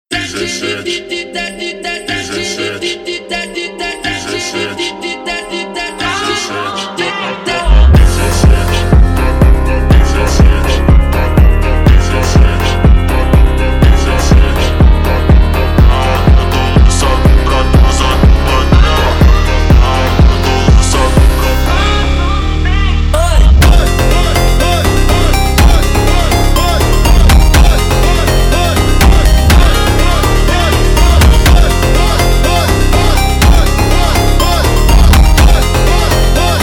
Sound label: Funk slowed